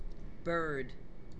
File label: bird; animals